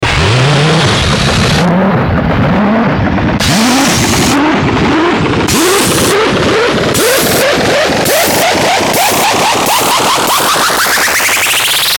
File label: Ascend Static Burst Glitch Stutter; Blastwave